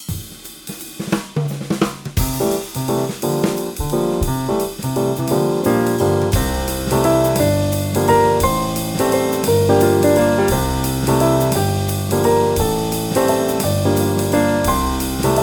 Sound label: Jazz Waltz; Scriptnotes